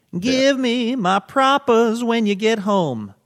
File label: sings give me my propers